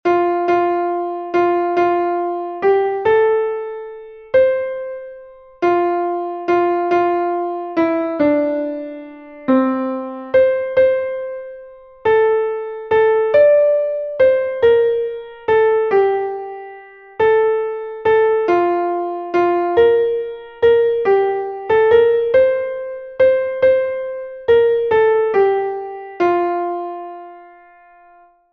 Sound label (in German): klavier; MelodieMeister